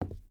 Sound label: footstep boots wood walk; Rust